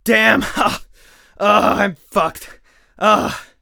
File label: hurt heavy; Escape from Tarkov